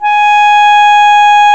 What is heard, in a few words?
Clarinets; Roland L-CDX-03 Disk 1; Clar Cls